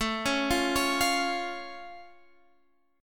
A Augmented A down; A+ arpeggiated

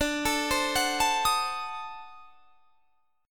D 7th Flat 9th D down, chord; D7b9; arpeggiated

